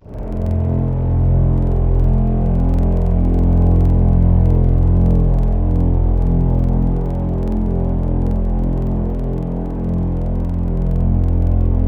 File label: Hi Strings, Wavetables; Synthetic's Serum Collection; Standalone-Music SYMPHONY Wavetables